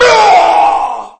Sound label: male infection; csco; csgo; zbm3